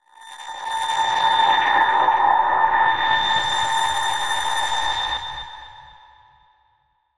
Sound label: sound design grainy shrill metalic whine delayed